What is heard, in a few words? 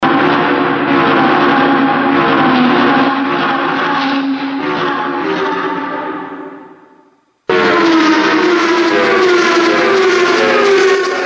car